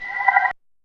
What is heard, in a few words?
new mail, msn